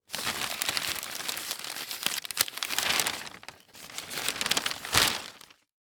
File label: Household Newspaper Rustle